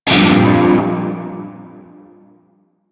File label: Shawzin Blues Chord F; Music; Warframe; Narmer Shawzin